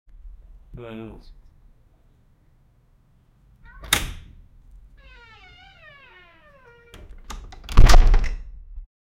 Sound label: leisure door opening